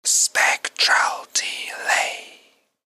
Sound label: stutter source